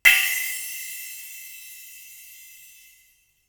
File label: Splash, drums singles; The Roots Drumloops